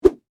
Whip Whoosh Large, Dowel, Rod; Foley; Whips (Sound Effects:Objects); BlastWave

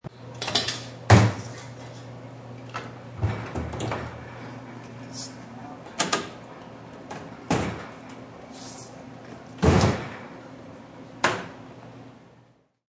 Various commerical kitchen noises; Pots and Pans; Restaurants

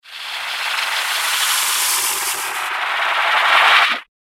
Kiss The Vampire, evil sound effects; horror sound effect; Andrew Fleming

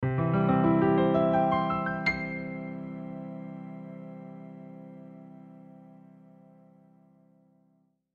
Piano Arpeggio, Piano Arpeggio Ascend Sustained; Musical; Blastwave

